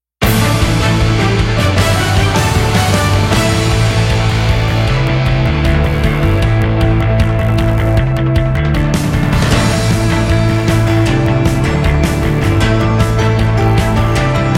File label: news music package